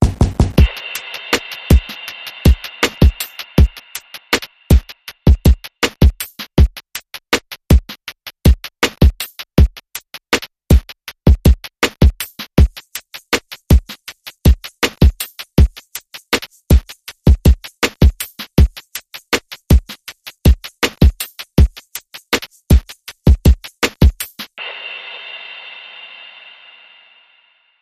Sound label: Elevator Musica Beat